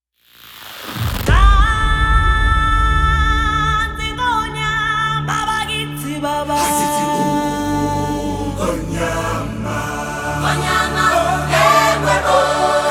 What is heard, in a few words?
Youth Choir